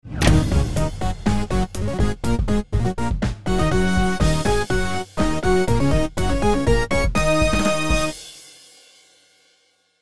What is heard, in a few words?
music win